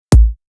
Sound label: bassdrum